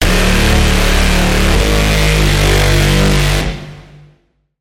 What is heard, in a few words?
Braam; Holistic Combatant; Routed Sound; Vital Expansion - Quantum Enforcer Vol.1